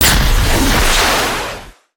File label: boost, vehicles; aronwk collection; ndaudio